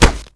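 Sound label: bullet hit kevlar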